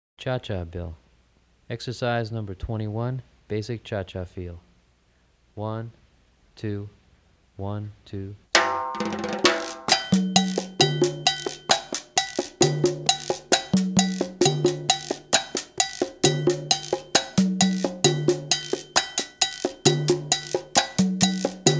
Cha-Cha Bell, Drumset

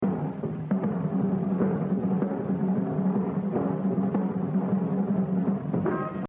Exercise 10, Jazz Drumming - 82%; Gene Krupa; The Evolution of Jazz Drumming (Gottlieb)

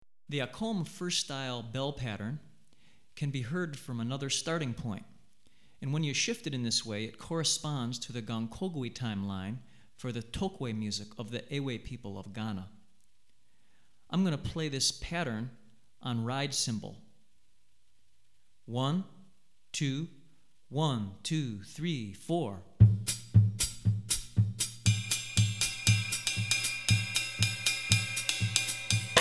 Akom, Shifted Bell Pattern First Style; Hartigan

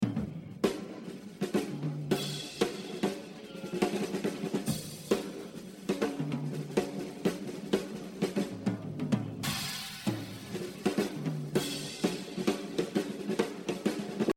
Exercise, jazz drumming

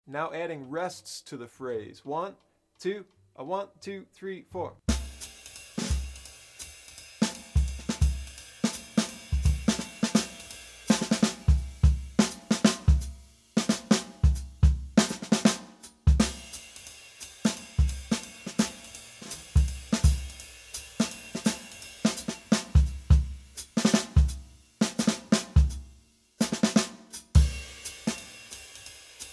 Rests within the phrase, Bop Drumming; The Art Of Bop Drumming; Riley

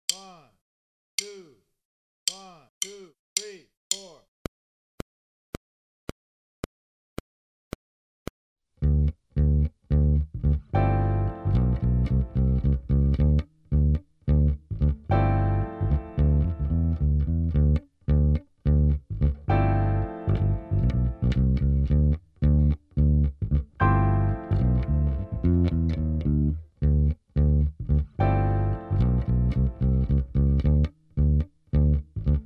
Pop, Drum Fills; Essential Drum Fills (Erskine)

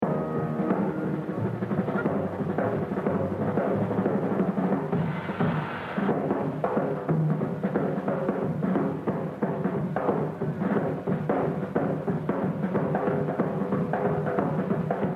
Jazz Drumming; Etude 5D Gene Krupa - 100%; The Evolution of Jazz Drumming (Gottlieb)

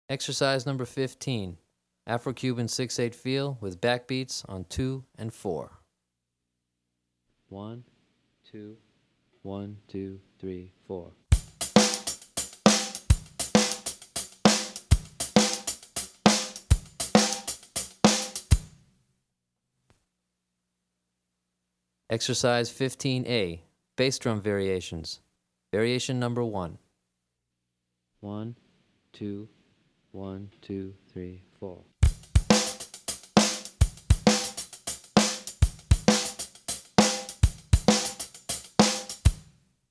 Afro-Cuban 6-8 Patterns With Backbeats, Drumset

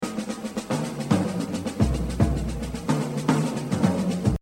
Exercise, jazz drumming; Buddy Rich; The Evolution of Jazz Drumming (Gottlieb)

